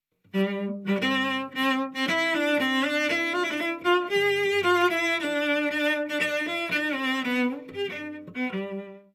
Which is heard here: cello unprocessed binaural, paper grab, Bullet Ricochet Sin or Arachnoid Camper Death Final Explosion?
cello unprocessed binaural